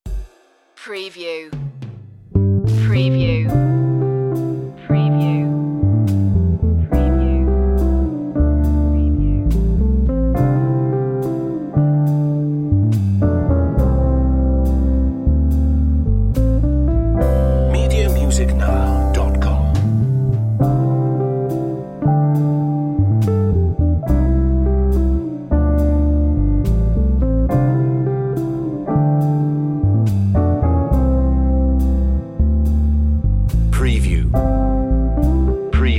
jazzy love

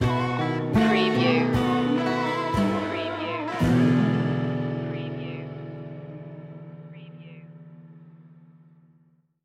fish fry blues stinger

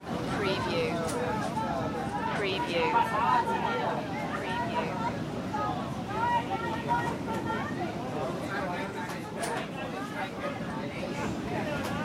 colombian beach bar

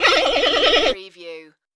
creature talk

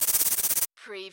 CARTOON FAST SCRATCH

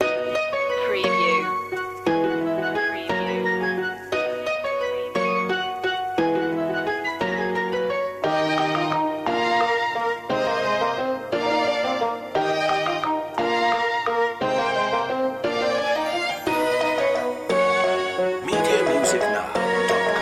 vintage vinyl

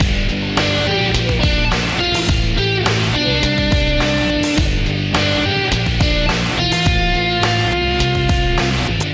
wind grunge loop

